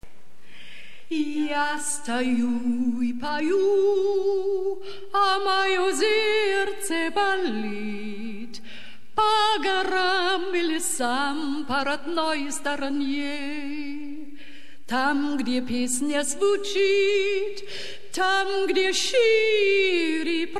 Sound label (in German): erzherzog johann jodler auf russisch